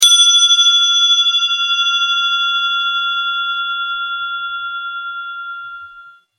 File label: vintage bell